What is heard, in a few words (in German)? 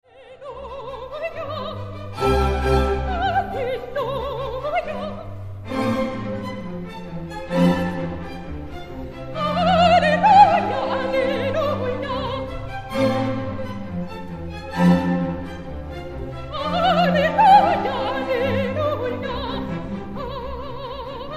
Motette; Stuttgart; Pro Musica